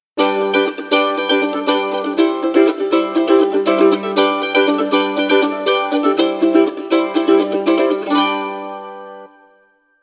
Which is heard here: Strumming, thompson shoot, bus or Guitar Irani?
Strumming